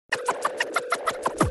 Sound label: Funky Sms